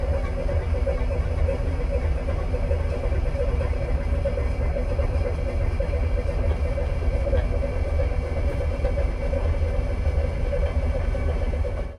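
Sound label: escalator hum like white noise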